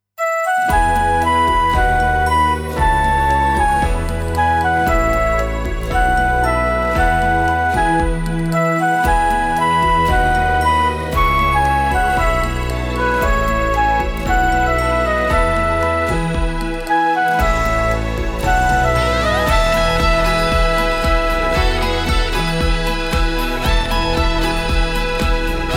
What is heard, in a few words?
sakura synth melody bpm115